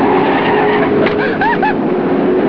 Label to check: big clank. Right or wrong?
Wrong